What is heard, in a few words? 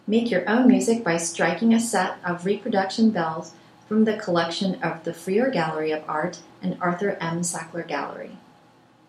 chinese bronze bells; VMFA